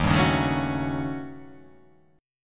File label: D sharp 7th Sharp 9th Flat 5th